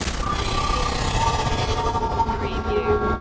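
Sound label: Production Element Chopper; Sound Effects Bible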